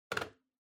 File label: Calculator (Desk Tilt)